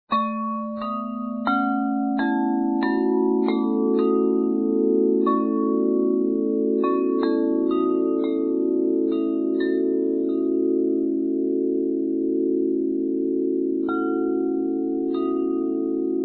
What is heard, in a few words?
Bass